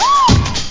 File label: whaa, voices; The Epic Collection 3; Epic Marketing; muzak samples